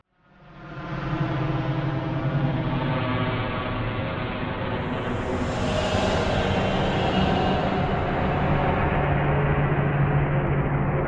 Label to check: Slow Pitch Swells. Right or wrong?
Right